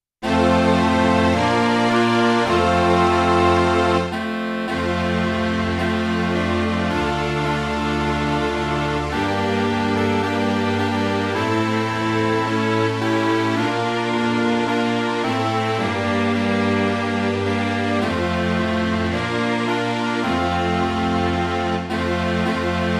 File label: midi